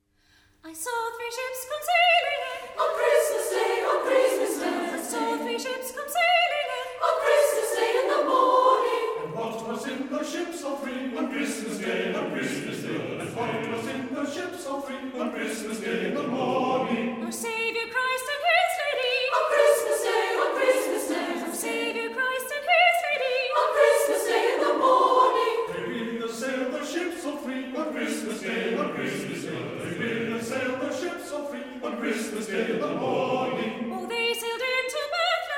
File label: I Saw Three Ships, Choir; Brisbane Concert Choir